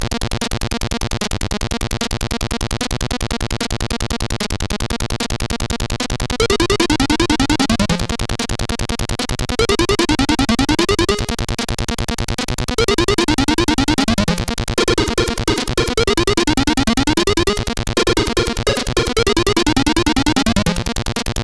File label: sid CSG8580R5; Tiger Claw